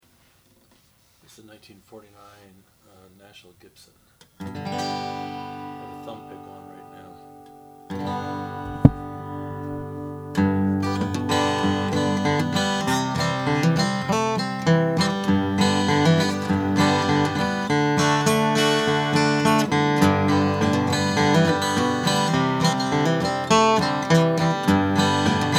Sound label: gibson national 1155 blackburst